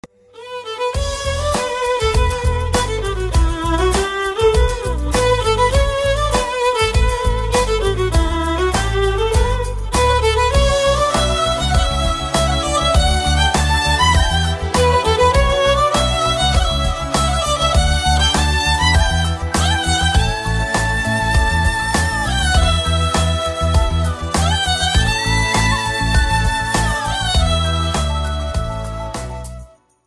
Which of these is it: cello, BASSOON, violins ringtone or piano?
violins ringtone